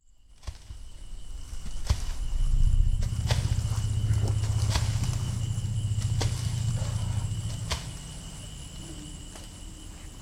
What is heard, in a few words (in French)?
elephants